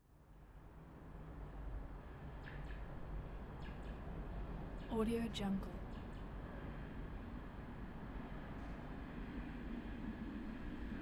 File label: tram passing by through a bridge; urban sounds